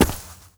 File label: warrior walk carpet